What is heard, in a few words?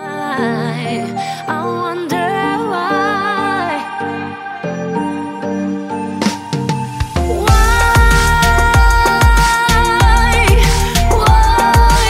English Ringtone